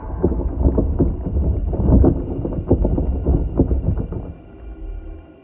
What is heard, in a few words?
Barnacle Rock Cracking Sound